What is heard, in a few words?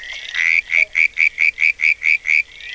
Litoria ewingii